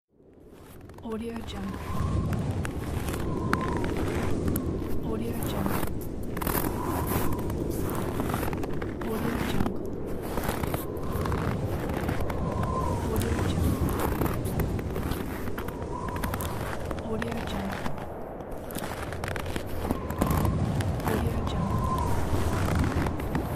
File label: walking on snow